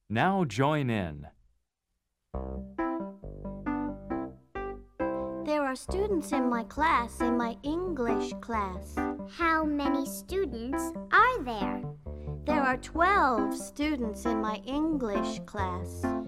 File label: Chant Group; logos english; Let's Chant, Let's Sing 1